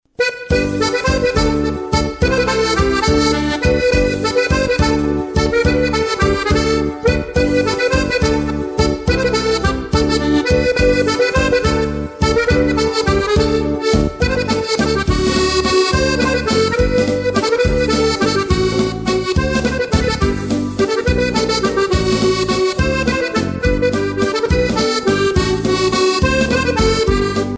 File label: accordeon